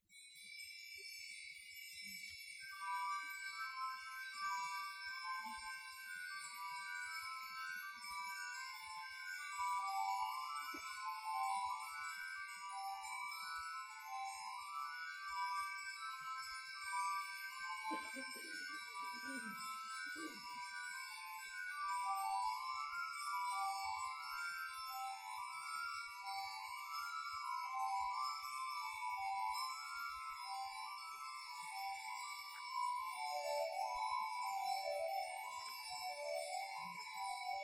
Fantasia in G Major, Organ; Bach; Recitativo; Organ Dedication

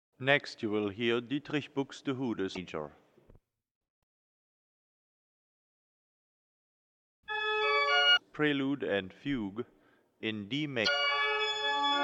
Prelude in D Major; Buxtehude; Prelude and Fugue in D Major BuxWV 139

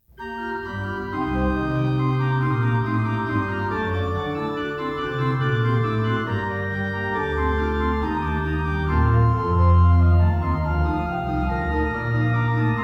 Orgelbuchlein BWV 603; Bach; Puer natus in Bethlehem

